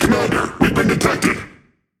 Alarms Sub Detected; World Of Warships

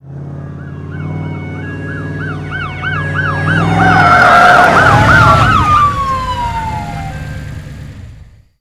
airport truck; fsb truck; Call of Duty Modern Warfare

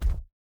bear footstep concrete hard; Rust

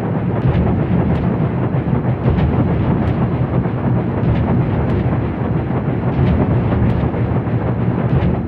ship engines; World Of Warships

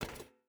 footstep barefoot metal walk; Rust

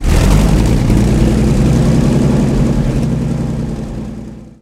cromwell engine load, tanks; cannons; War Thunder

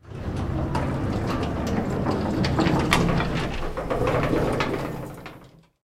airstall rattle, engines; War Thunder

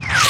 combat atmosphere; World of Tanks

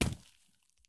soil sprint; Escape from Tarkov

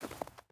footstep boots snow walk; Rust

